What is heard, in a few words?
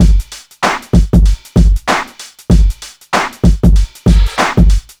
96 bpm drum loop g key, Free drum loop sample - kick tuned to the G note. Loudest frequency: 572Hz